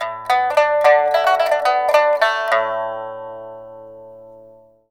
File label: PIPA PHRASES; Spectrasonics; Heart of Asia